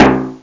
timbale short lo; Amiga Special - Software Hits; orchestra; drum

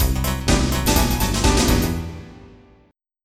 FILL, HOUSE; YONCA

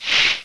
ice blast; Odyssey Demo

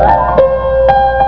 Music Intro; Arcade; Tetris; s'ydnar squares 1.1

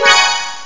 bells rain

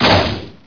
droid hit; mission sound

